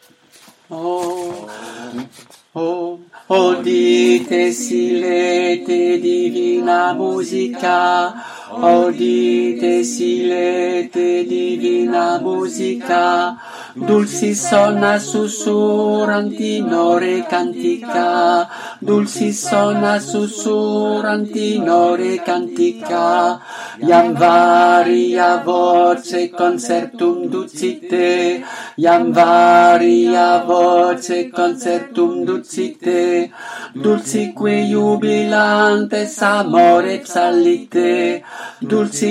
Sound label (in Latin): Audite silete alto; chorale